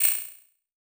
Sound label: Multimedia Internet CD-Rom Flash Ding